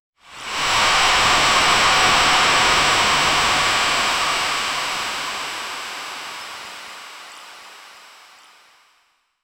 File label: contained organic expansi